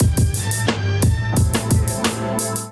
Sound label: Kit Melodic 88 bpm, Drums Complex; MIDI Clips; Safe House; Ableton; Chop and Swing